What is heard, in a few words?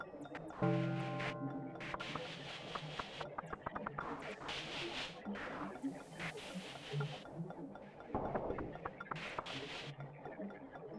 raucous village; adarkroom